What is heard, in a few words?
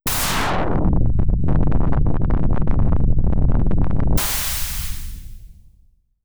Bubbler; AMG - Prototype Drum & Bass